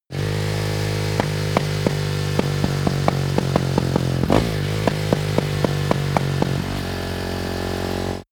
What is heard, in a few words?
motorcycle motorcross decelerate clatter